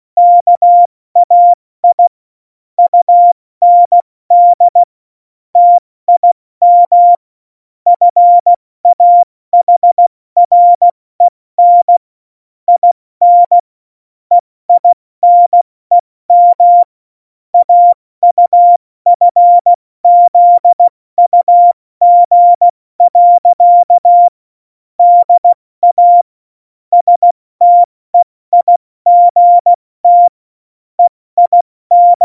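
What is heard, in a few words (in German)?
Witz, cw morsen; Morsekurs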